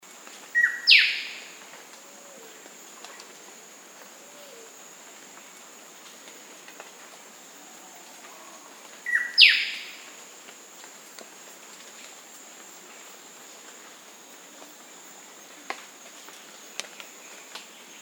black sided robin

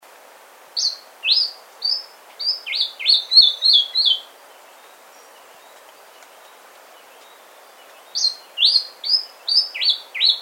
white rimmed brush finch

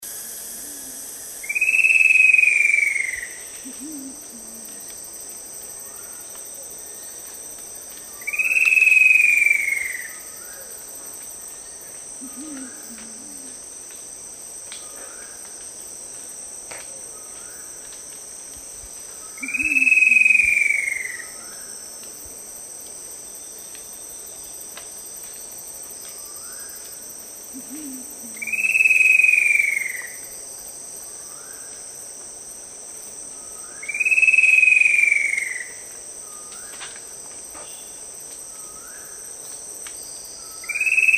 yellow billed kingfisher

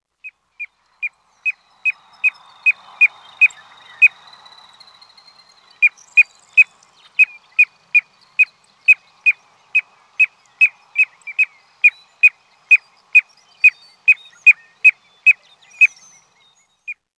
piping plover

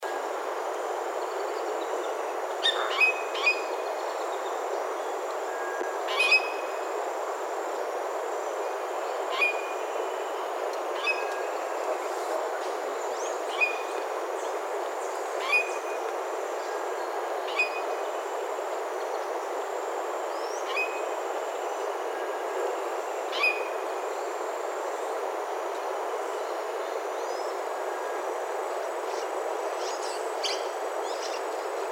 bald parrot

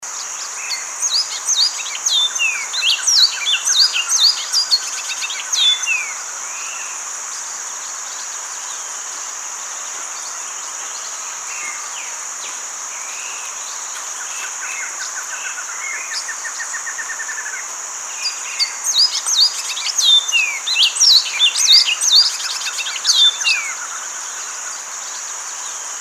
large billed seed finch

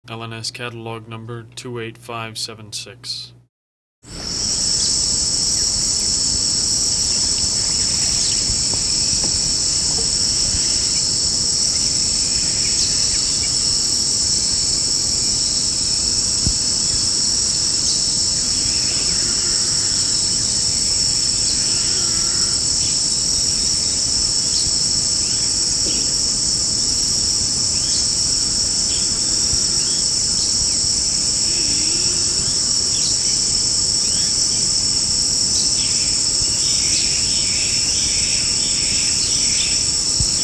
fasciated tiger heron

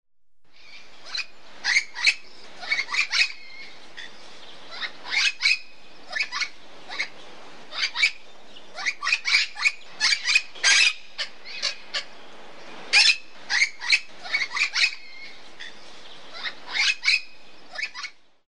lilac crowned parrot

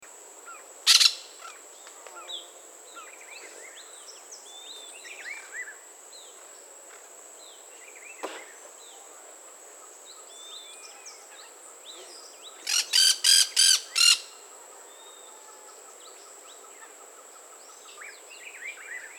brown throated parakeet

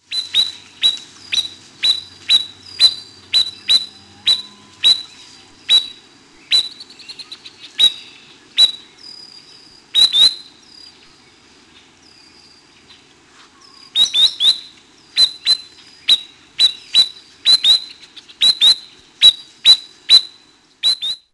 spotted sandpiper

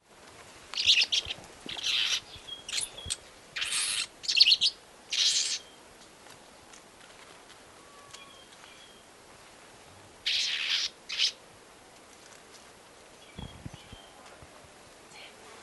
small tree finch

